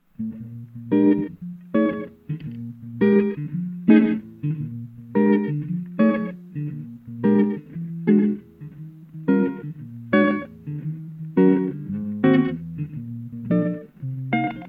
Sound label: improvisation, solo guitar; Ted Greene